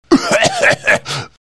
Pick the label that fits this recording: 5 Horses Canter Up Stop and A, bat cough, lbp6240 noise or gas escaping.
bat cough